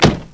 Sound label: DOOR SHUT